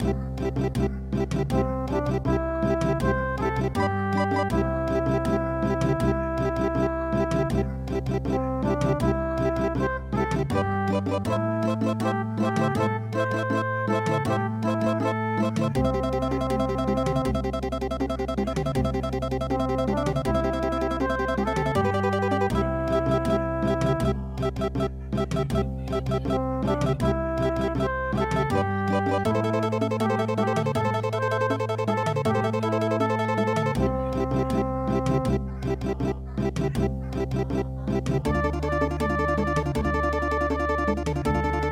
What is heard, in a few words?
Andes; Pascadores